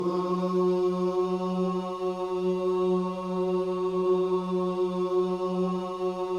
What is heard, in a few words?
OOH, Gospel; Spectrasonics Vocal Planet